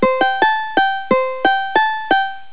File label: UKULELE